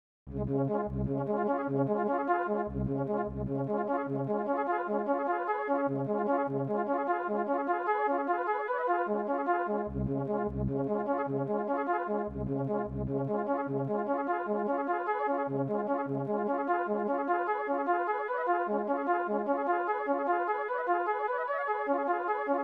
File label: restful atonality